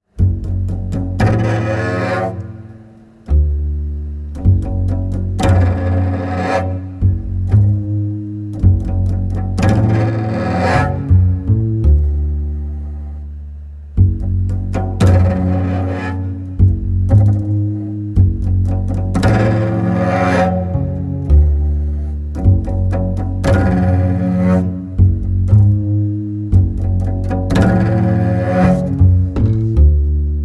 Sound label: String quartet grain, The accordion